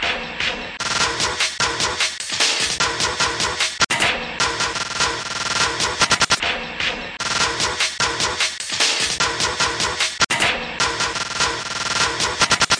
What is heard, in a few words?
tin box junglist, drum loops and breaks; 141 to 160 bpm